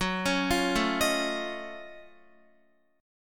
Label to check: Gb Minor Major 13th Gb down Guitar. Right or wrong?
Right